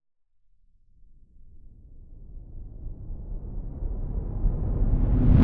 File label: Swell; bottlerocket